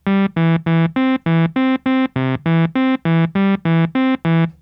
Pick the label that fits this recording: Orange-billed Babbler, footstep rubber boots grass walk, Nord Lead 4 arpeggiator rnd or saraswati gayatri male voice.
Nord Lead 4 arpeggiator rnd